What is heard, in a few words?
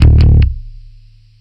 170 NuMetal Bass D; Rock Hard